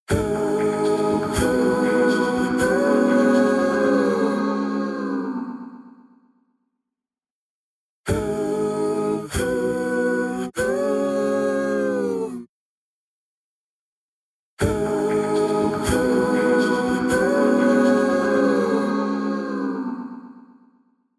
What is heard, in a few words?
Vocals Nice Ambience; H3000 BD Mk II